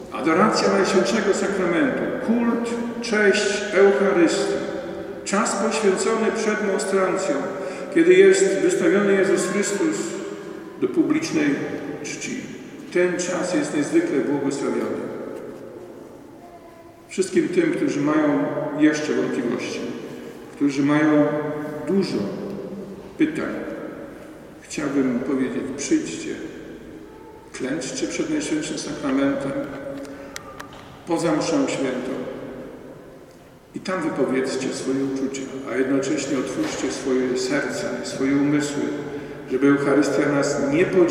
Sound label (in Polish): adoracja